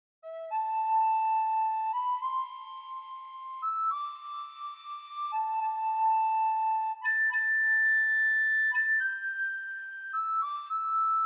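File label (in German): solo The House of the Rising Sun, Sopranblockflöte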